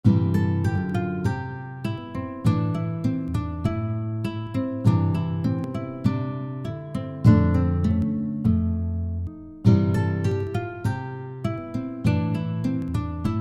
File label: Tibetan Melody